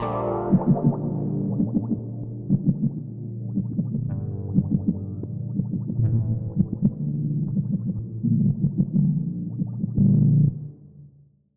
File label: Dark Synth, cinematic drama soundbeds; musicradar